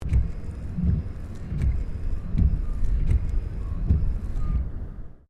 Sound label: Car Toyota Corolla windscreen whiper exterior; 2009 Toyota Corolla hatchback; Cars; Vehicles